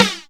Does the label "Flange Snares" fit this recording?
Yes